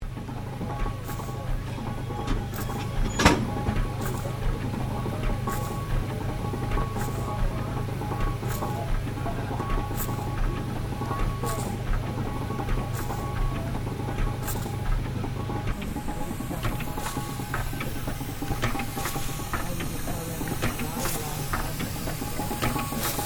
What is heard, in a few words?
Tractor steam traction engine 1900 idle; John Fowler; Vehicles